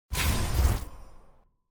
tier wings promotion; ranked; from diamond

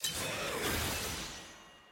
click; skin evelynn

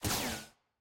skill aah; shaco; skill 1

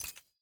dropdown click; lol

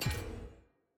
ui locked; jinx

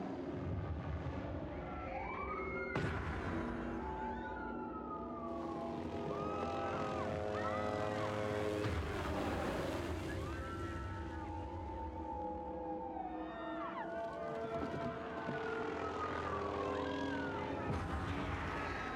amb

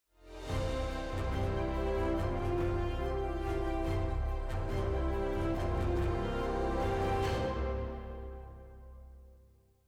trophy ceremony music; clash

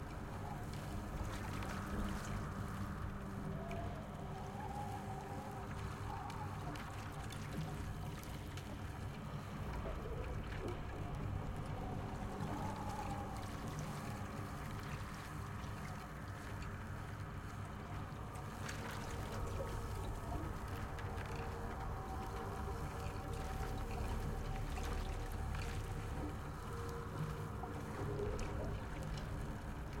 amb freljord iceberg

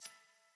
ui hover; purchase

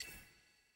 ui hover; guardian

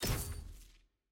ui warning exit

